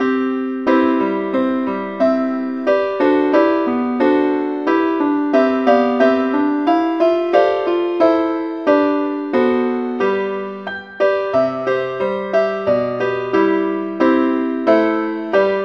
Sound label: keyboard only key c